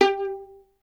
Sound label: STR VLN, Solo Instruments; Miroslav Vitous